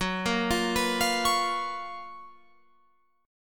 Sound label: Gb Major 11th down Guitar Open F; GbM11 arpeggiated; JGuitar